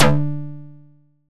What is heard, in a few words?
Hit, Stab & Hit; Bitkits